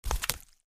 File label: trog foot, street trog